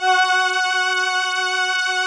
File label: VP-330, String Machines